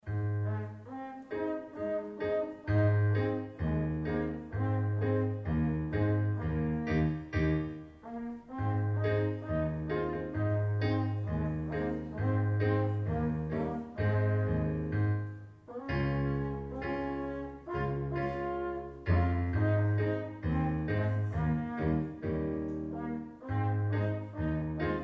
Jungbläser Bläserensemble; Musikschule 2005; Oh Susanna

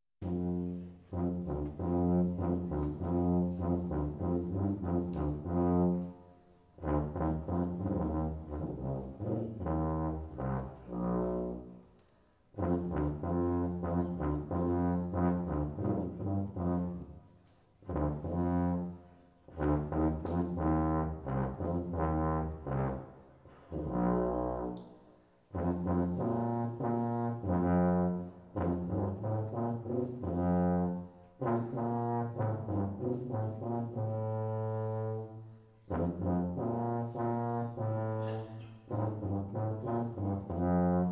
Solo Bass, Caisson Song; Musikschule Kölliken

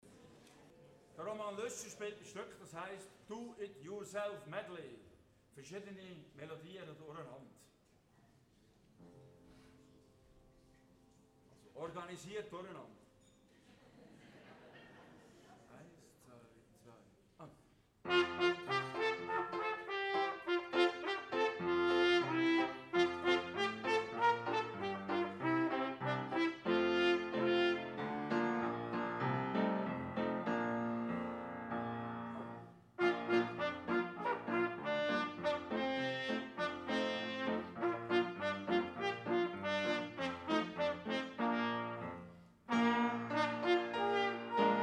Jungbläser

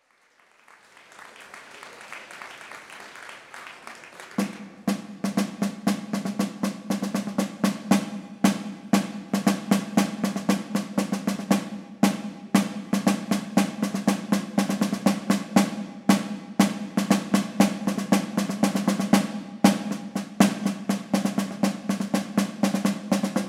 Tambouren; Am Chinderfescht

